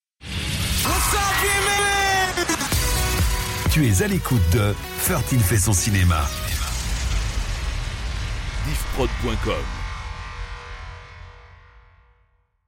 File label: Jingle Cinema; Thirteen